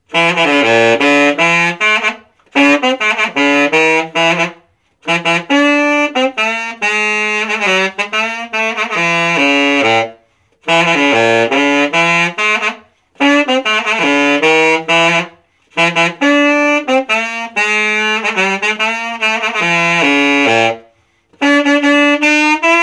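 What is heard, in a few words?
saxophone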